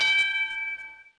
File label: Start; wesole przedszkole bolka i lolka; olimpiada letnia